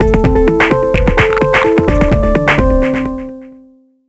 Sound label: Jingle Winter; Deal or No Deal Special Edition; NDS